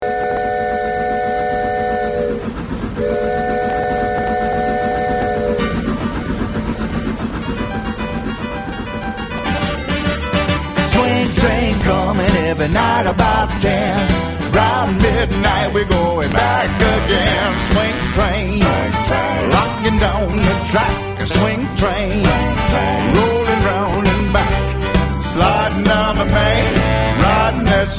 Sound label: SWING